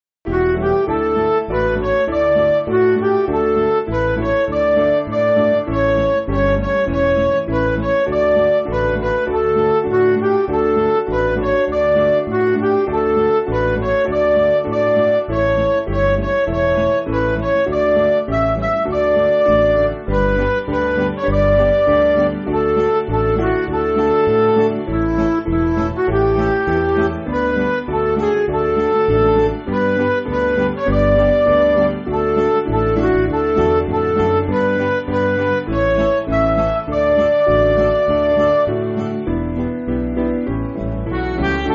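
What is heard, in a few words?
Music Backing Files for Churches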